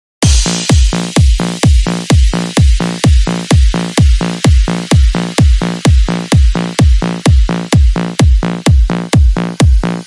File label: House music